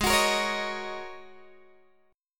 Ab 7th Suspended 2nd Suspended 4th, Guitar, Open G